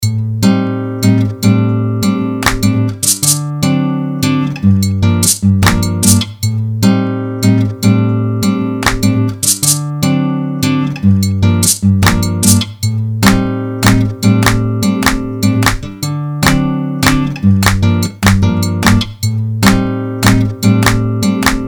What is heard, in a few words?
smooth latin